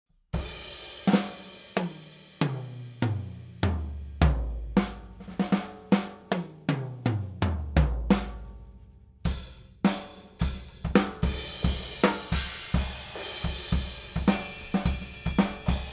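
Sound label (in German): MKH40 OH solo ganzes Set, Drums; MKH40- nur Overheads - ganzes Set - flat; Glyn-Johns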